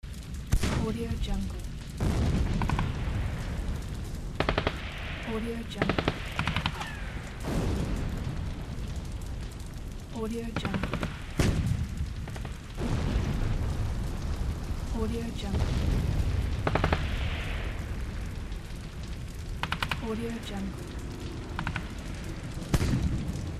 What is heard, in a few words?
war battle ambience; mega-loop